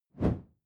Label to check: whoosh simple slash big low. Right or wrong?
Right